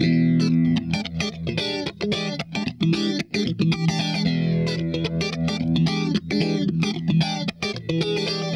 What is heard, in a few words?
GRIND, Funk Guitar; 90 s Sample CDs; Zero G